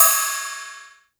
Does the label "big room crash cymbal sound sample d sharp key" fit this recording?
Yes